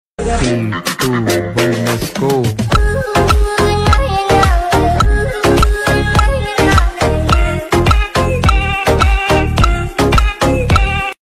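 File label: instrumental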